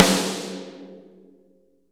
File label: RRFMH, drums; Best Service; Real Mega Drums VOL-1